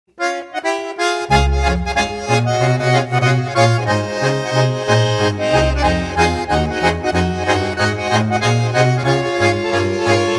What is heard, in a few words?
Fox; Demo Lägerli